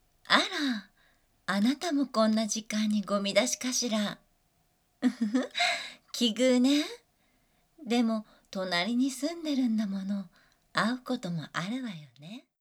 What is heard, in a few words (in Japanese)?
乳首舐め; 隣人; お姉さんの誘惑でねっとり乳首責めされちゃう話; バイノーラルASMR